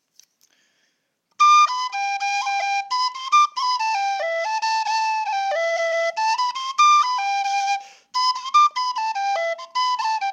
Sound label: jig; cap breton